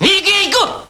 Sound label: Japanese Shout; Mars Sortie